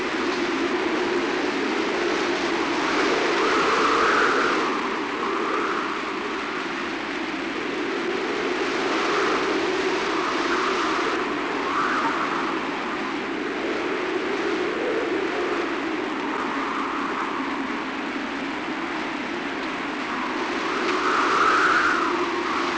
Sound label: wind sea; Pirates Of The Caribbean